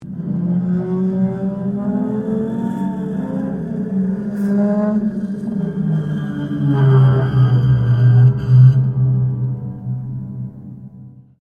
PIANO UPRIGHT BROKEN STRING SLIDE UP DOWN, Upright piano without keyboard; Pianos; Musical